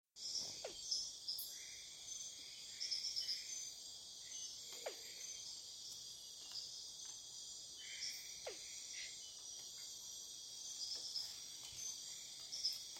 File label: Engystomops petersi; guyane